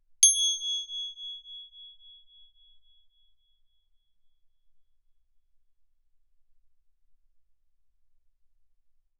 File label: crotale Ab7 ff; Percussion; crotales